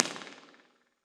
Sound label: Space 4 Art Gallery, Impulse Response; EchoThief Impulse Response Library